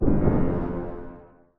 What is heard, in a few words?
Rebloom Drum; Musician